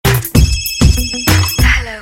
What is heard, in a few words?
Ring Sms